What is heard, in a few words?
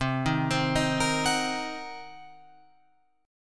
C Minor 7th Flat 5th Guitar Open F, chord; Cm7b5; arpeggiated